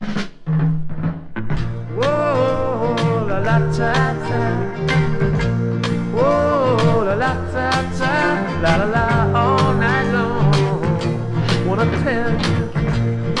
extra female backing vocals stereo mix

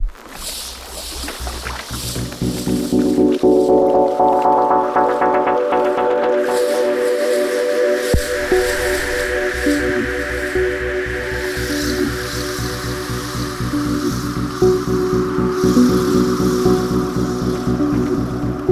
beach ambiance lounge chillout mix

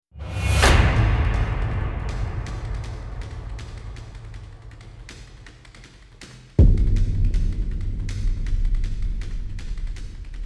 drums and rise

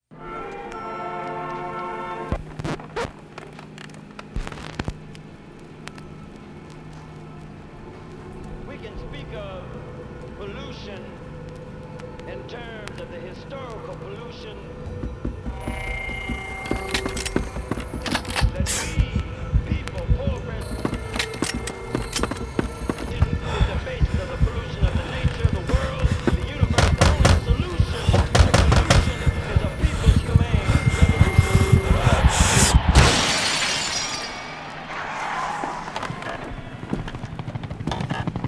prelude

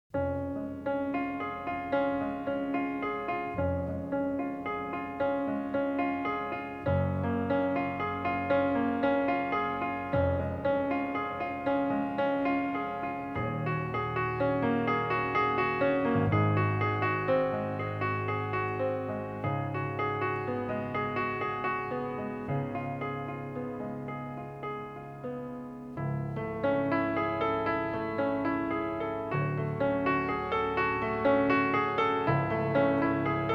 solo piano mix

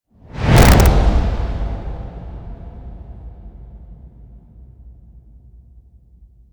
over under ramp

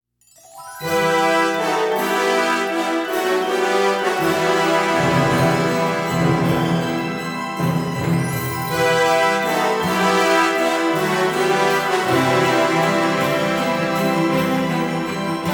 we three kings non choir

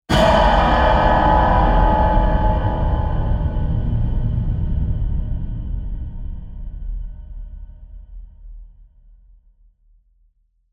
hit metal

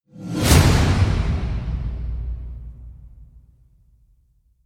whacks titan with whoosh

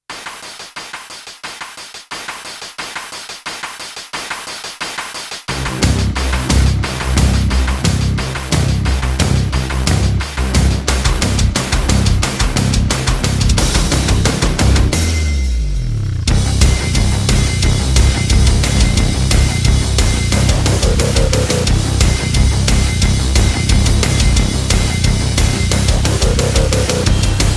no guitar

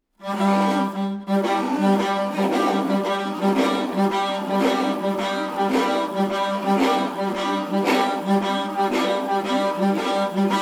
inuit throat song games dogs

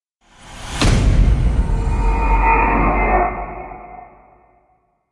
evil slams; necromancer

